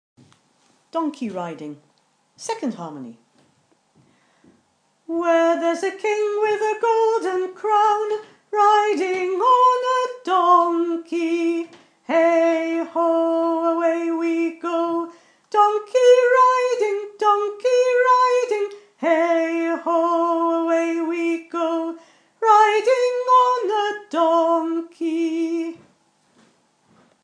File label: Donkey Riding harmony